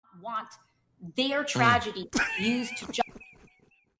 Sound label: tragedy fart